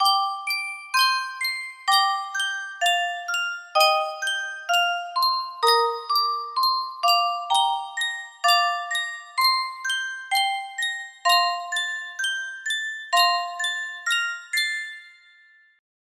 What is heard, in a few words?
Sankyo Music Box, music box melody